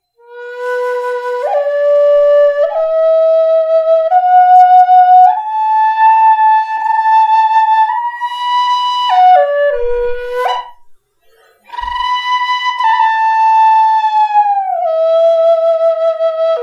B Forest Flute Walnut and Red Beech; Southern Cross Flutes

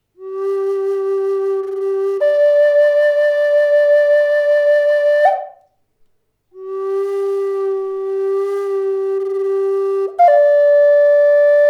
G Cedar, flutes; Southern Cross Flutes

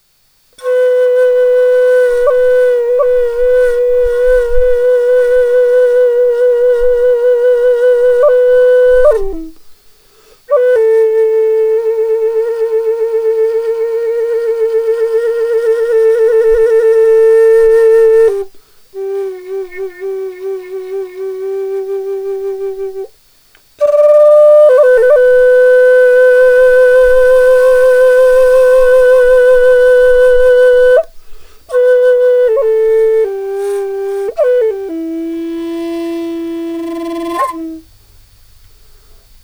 wobble, flute

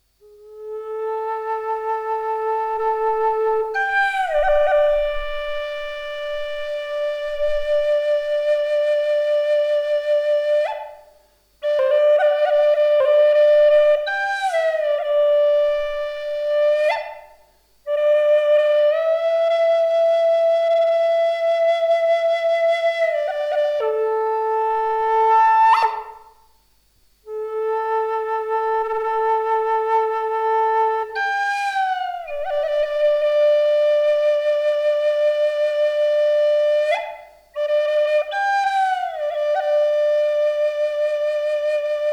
Am Love Flute Kauri

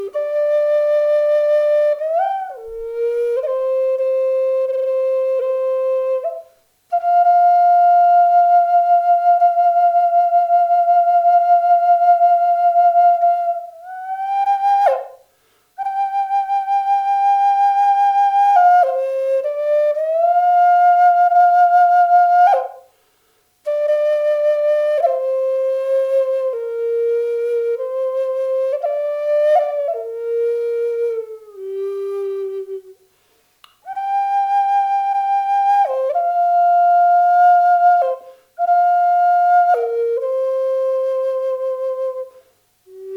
G Kauri flute; Southern Cross Flutes

